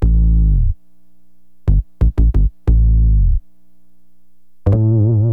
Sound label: BASS, HipHop; LiveAct Pool